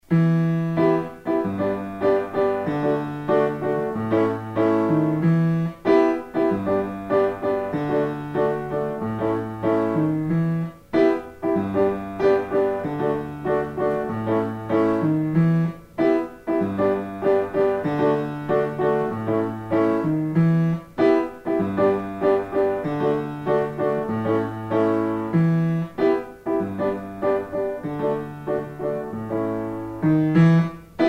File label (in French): accompagnement